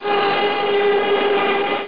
falling; How Many Bugs in a Box

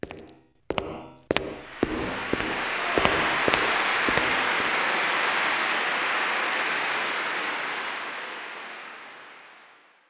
audience; phoenix-end

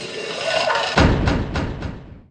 BOUNCE IN; Marbles

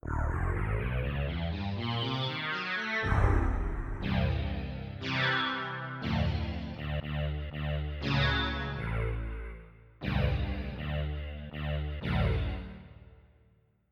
Sitar Bend Up

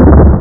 Secret Weapon; Technoids 1.0

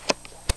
Flip, Card; Cribbage; Cribbage 2.5

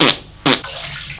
duck; Little Red Riding Hood

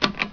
Drawer Arrow Click Sound; Widget Workshop; A Mad Scientist's Laboratory (1996); Maxis

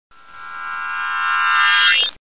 door close; How Many Bugs in a Box

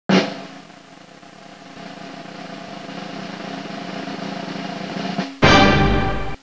drum roll; American Girls 2; SAMPLER

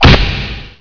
claw snap; Hexen II; scorpion

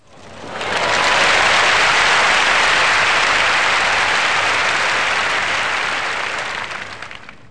APPLAU

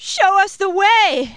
worship

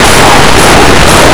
knock; Blue Heat

